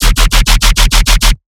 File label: bass D-; Heavy Rippers